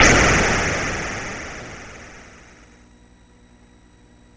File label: C64 Filter Explosion